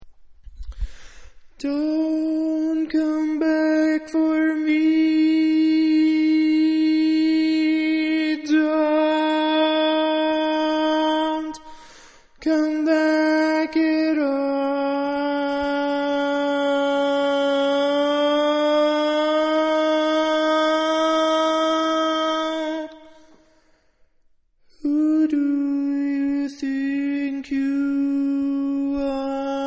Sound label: Lead, barbershop tags